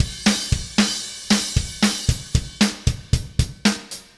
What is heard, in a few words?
Haze Beat, Indie Rock; Sonic Foundry (Sony Creative Software); Indie Rock Anthems; Session 06 - Haze